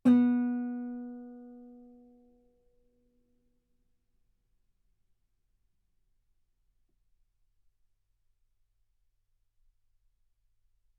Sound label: Guitar B3; ff; sul B